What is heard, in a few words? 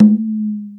BOOOOOOO, Percs